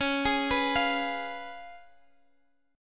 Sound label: Db 7th Db down Guitar Open G